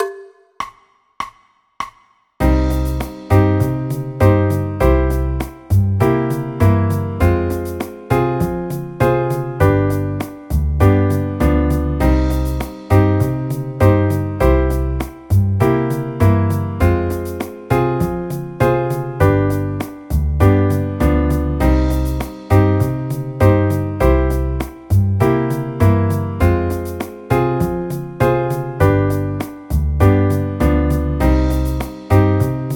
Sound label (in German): c moll akkordverbindungen